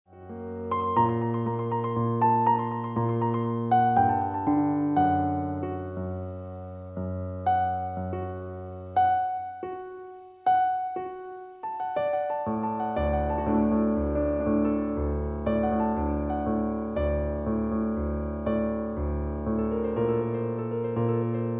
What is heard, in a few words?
Piano